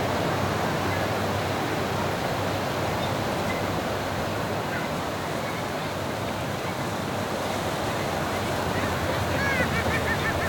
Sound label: Harbor Ambience; gamerip; shu; ps vita ps4 switch windows